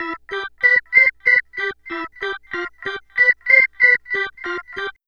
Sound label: Ballpark Tone, Trip-Hop Loops; Rondo Brothers; Sing Into The Machine; Sonic Foundry (Sony Creative Software)